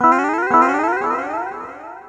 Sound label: 115 E-Coast HipHop Echo Guitar E